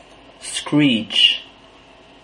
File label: screech